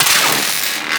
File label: Electricity; Proc; Warframe; Mods